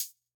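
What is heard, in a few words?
Cl Hat, closed hat; Grime; 135bpm; Zero-G Sound Sense Grime Scene; Construction Kits; A Positive Vibe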